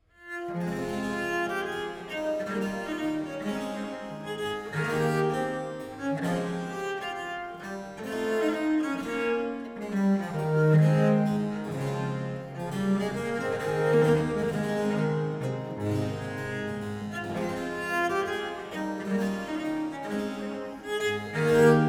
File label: Suite in F-Sharp Minor, Courante, Pièces de Viole; La Gracieuse Pièces de Viole; Marin Marais